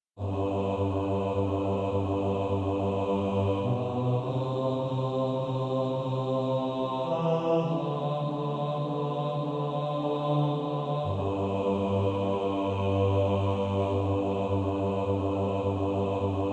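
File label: bass, practice music, SATB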